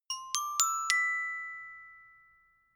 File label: notification glockenspiel dry sequence ascend